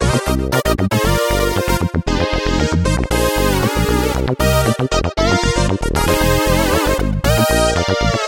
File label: Poly Bends; Renoise